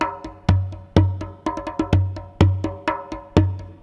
PERCLOOP ETHNO 125; eJay

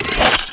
siren; NFS3DEMO

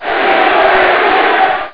crowds; crowds2

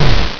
Bang Bang; AeroStyle

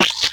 waterspit; Neverhood

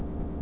ENGINE IDLE; DirectX SDK; Donuts3D

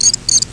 blackbird; Sound Effects for Multimedia - Volume 1; birds

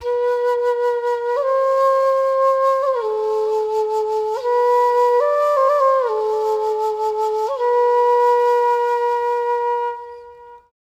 flute, ethno; eJay

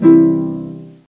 COMP BOOT; Comedians - The People Behind the Laughter

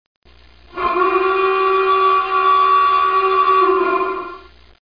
ALARM; Talking E-Mail

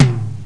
tom tom; Drums

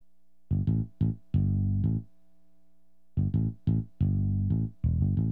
BASS; LiveAct Pool

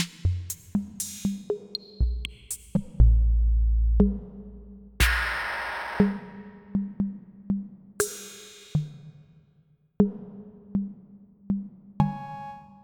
All Perc Chorus

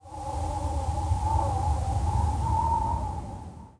WIND, wind; zones

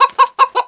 CHICKEN; outlaws

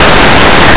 fridge; Blue Heat

